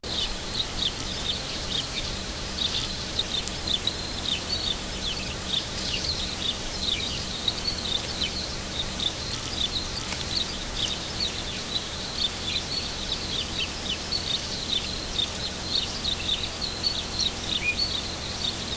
early morning countryside

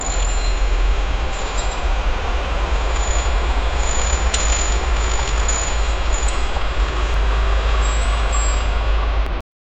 deep forest ambience slow; wood cracking

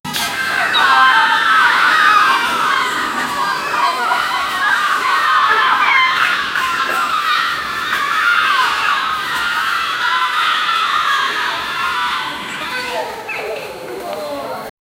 audience crying and talki; chaos

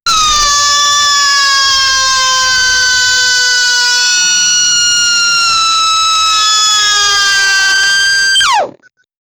screaming animatronic

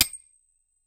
pick axe hitting crystals

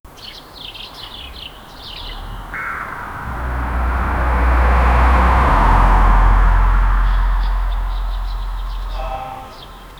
soft cinematic background; flying

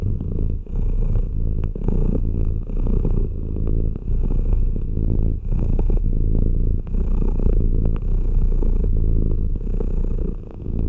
the sound of a cat, birth

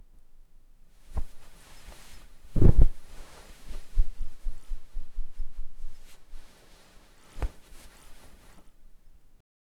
gentle rustle of bed shee

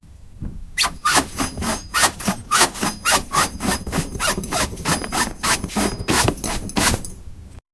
workers scrubbing cloth

